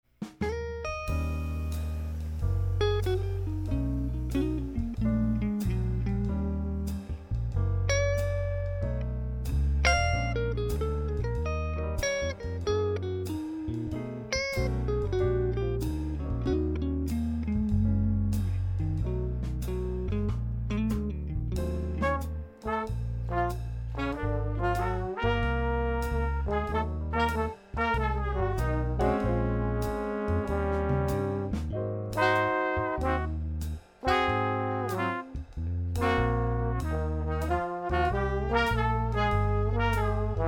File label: black forest blues